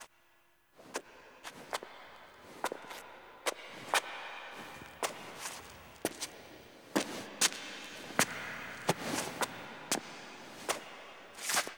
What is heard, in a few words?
Foley Walk; Ostron; Warframe; Quests; The New War; Narmer Outpost; TNW Snt Chamber Archon Intro